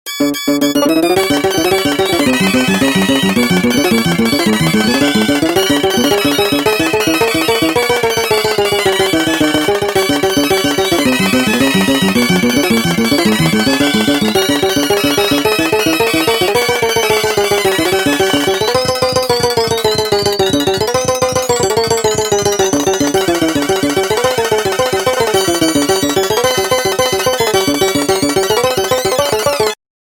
slide

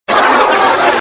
crowd

